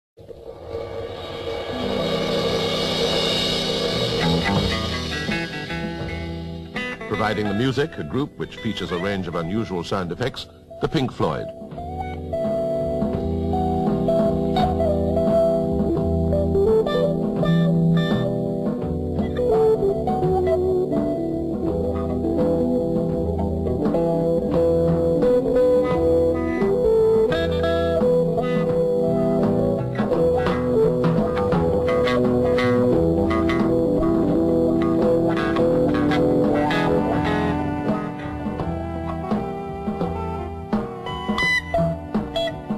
instrumental improvisation

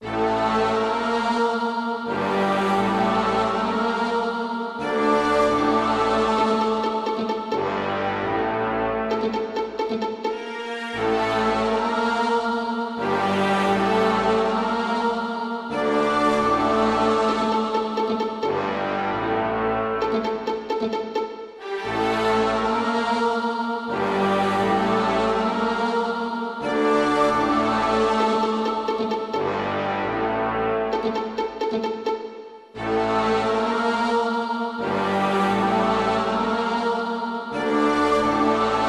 loop uplifting

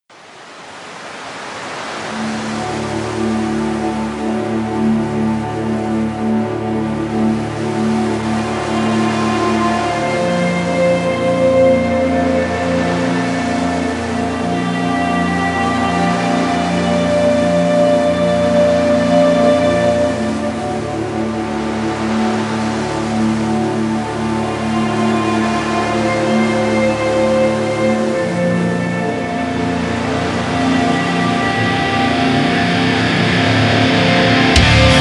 instrumental

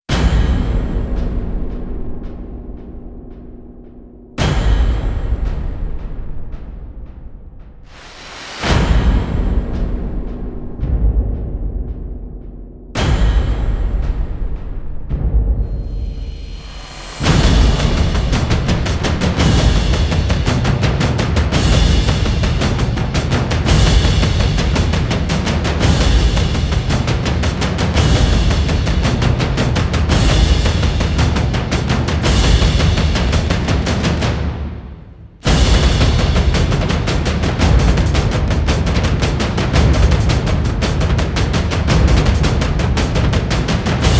drums build

